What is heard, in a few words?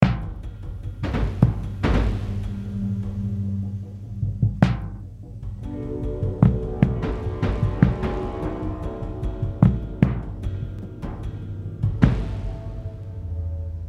Drums Metal Harp Hits